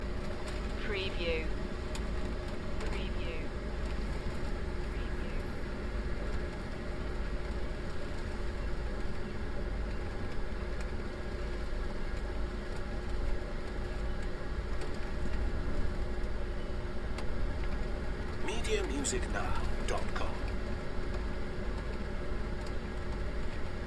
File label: Motorhome heater rain loop; beanstalk audio